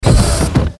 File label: Sci Fi Robot Machine Servo Motors; Robots